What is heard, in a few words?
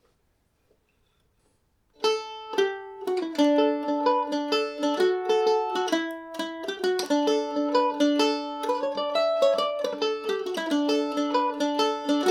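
D major, irish mandolin; Pat Wards; G&O #34 mandolin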